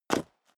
step high heels concrete grit single stair up; schritte; Footsteps; menschen

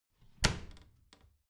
bathroom cabinet door creak close heavy

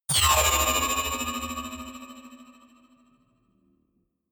sci-fi magnetic sling big hard; energie

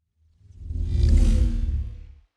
sci-fi vehicle train drive by hum; raumschiff

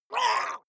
water monster female gargle groan pain short; koerpergeraeusche; comedy-cartoon; soundpool

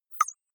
click synthetic light tonal, signale

